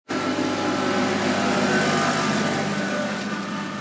truck

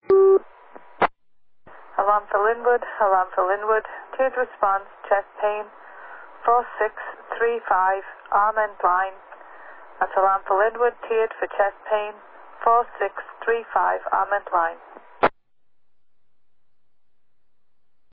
medical page received; linwood

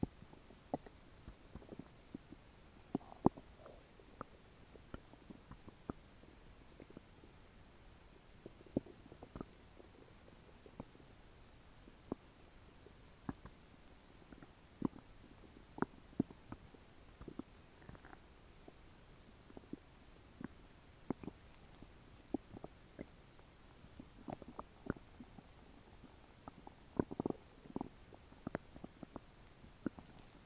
mystery noises

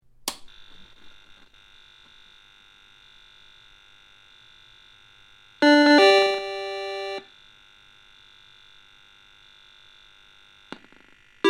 Vectrex startup and buzz

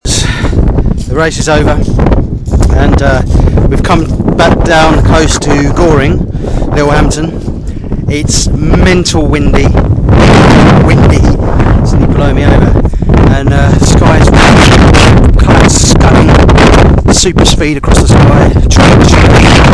sea

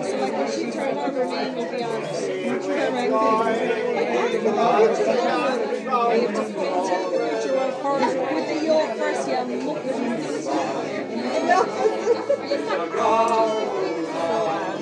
midwinter evening in paddy guerin s pub

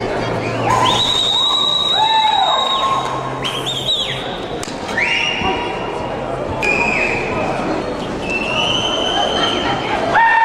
jubilant crowds

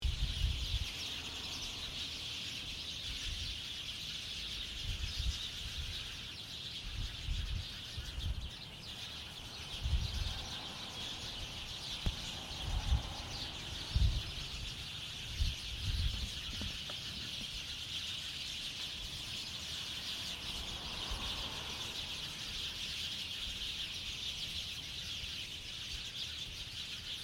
a palm tree filled with birdsong

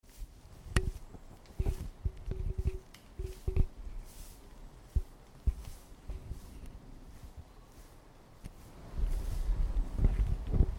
grass

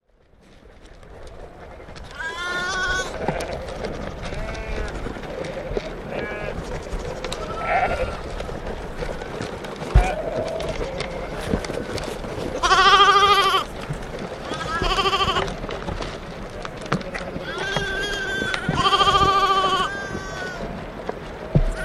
sheep feeding; uksm

